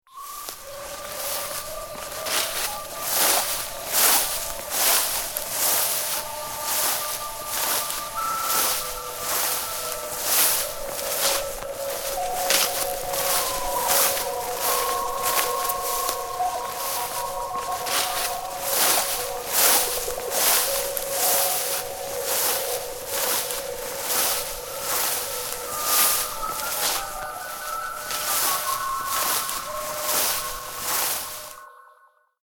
Walking Through Jungle, Footsteps walking through long grass with jungle ambiance.; Human